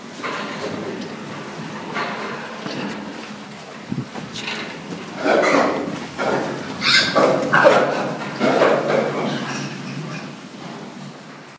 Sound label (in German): Warnruf von jungen Mastschweinen